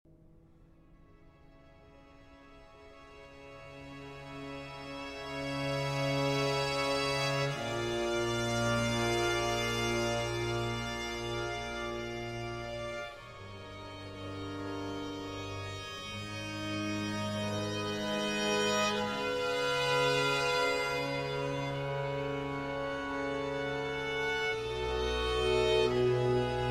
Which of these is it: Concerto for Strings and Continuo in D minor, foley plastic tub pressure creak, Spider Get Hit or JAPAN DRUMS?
Concerto for Strings and Continuo in D minor